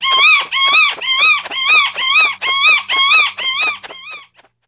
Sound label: High-pitched Squeals With Wing Flaps, Single Bat; bats